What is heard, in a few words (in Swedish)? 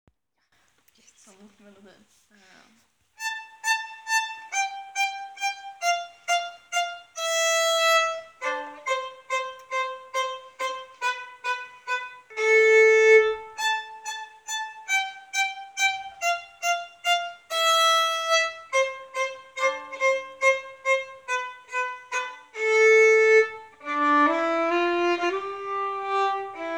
Idiotvalsen motmelodi